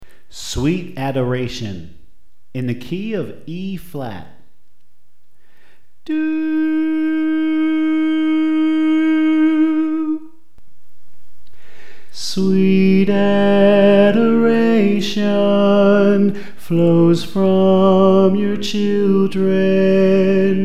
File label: Soprano